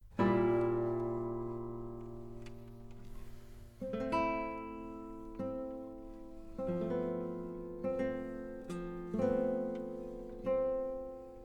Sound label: Improvisation Tenuto